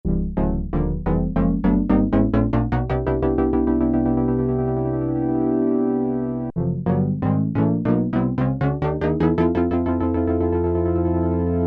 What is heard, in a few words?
BOUNCING BALL, Oberheim OB-8; Factory Patches